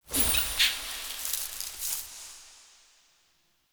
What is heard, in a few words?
Rat Mote; UI; Animal Motes